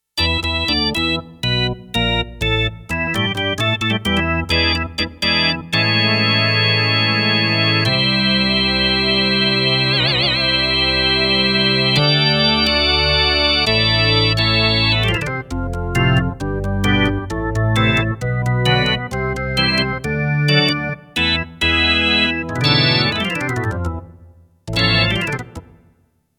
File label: ROCK ORGAN, Roland JX-1; Factory presets